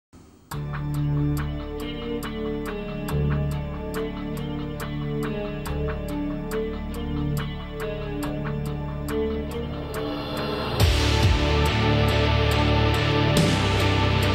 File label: Defeat; The Eye of Judgement